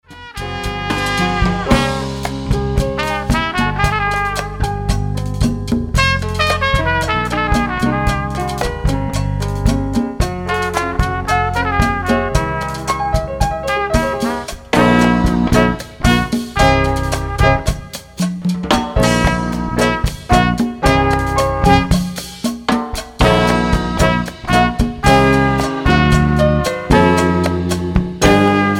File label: jazz